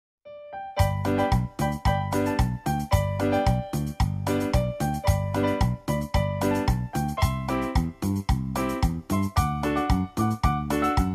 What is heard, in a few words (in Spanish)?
Cumbia Navidena, ritmos, festivos